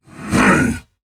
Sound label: Attack Effort; Warframe; Dax Heavy; Battle Barks